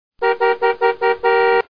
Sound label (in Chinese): 鸣笛; OvertakingLegend